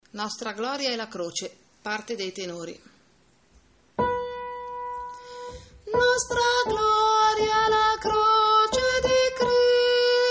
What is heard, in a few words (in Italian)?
nostra Gloria La Croce Tenori